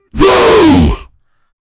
human battle cry sounds, angry

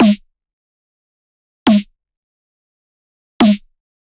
snare mono dubstep

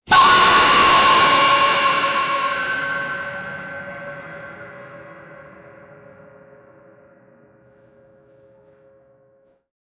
monkey throws hard shit; comedic

